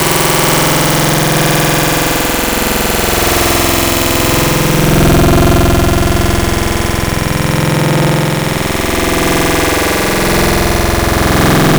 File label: Growl Tables; Terror Bear; Cymatics; SERUM; Growl Tables Vol 2